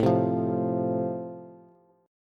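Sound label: A Minor Sharp 5th, Bass, chord strum; Am#5 strummed; x,12,10,10; JGuitar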